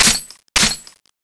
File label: reload, weapons; skull2